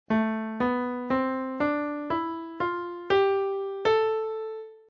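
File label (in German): Tonleiter a Natürlich Leitton; die moll-tonarten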